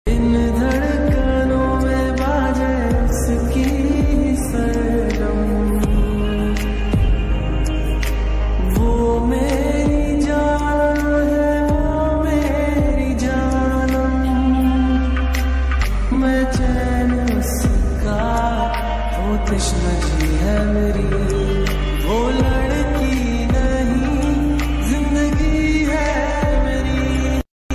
sad song ringtone

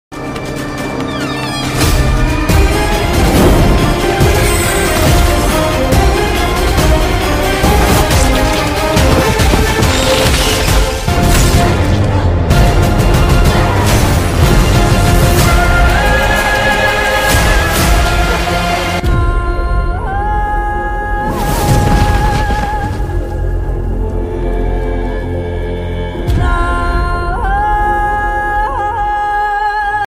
trailer bgm ringtone; virupaksha

